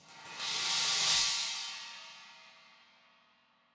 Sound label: China Flourish, cymbal; SAA XCC 18; apulSoft apTrigga2; Multi-Velocity Cymbal Samples